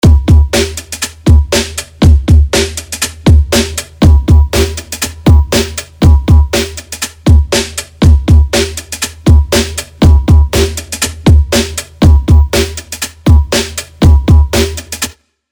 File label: Drums Digital Kick